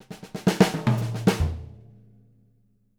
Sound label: SWING, Living Drums